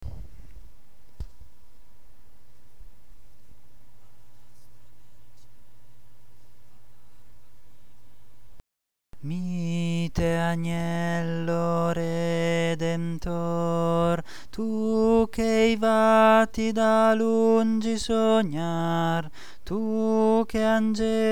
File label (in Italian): voce tenori; astro del ciel